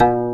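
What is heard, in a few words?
gayageum C3, Korean; AKAI S6000 CD-ROM - Volume 1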